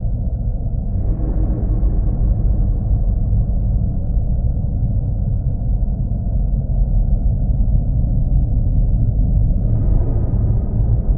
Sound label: Horror Ambience Loop; Poppy Playtime